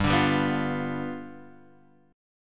G 7th G down Guitar Open F; G7 strummed; JGuitar

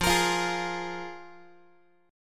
F Minor Double Flat 5th, F, Guitar; Fmbb5 strummed; x,13,13,15,12,x; 4A,4E,5A,5D,5G#,6C#

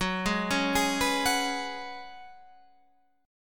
Gb Suspended 2nd Suspended 4th, Guitar Standard; Gbsus2sus4 arpeggiated; 14,11,11,13,12,14

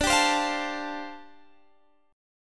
G 7th Suspended 2nd Suspended 4th D chord

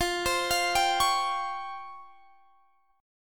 Db Major Sharp 11th F

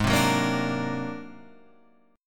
G sharp 9th Flat 5th G sharp; G#9b5 strummed; JGuitar

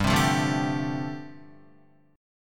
G 7th Suspended 2nd Suspended 4th G, Guitar

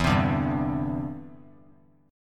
E Minor 7th Sharp 5th; strummed

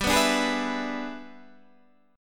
E 7th G, guitar; E7/G strummed; JGuitar

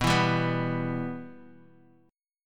B Suspended 4th Sharp 5th B Guitar Open D, chord

